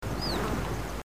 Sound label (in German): fiepen, Geparden